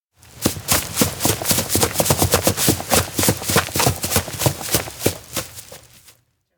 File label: steps outdoor grassland run jog; spica; anemoi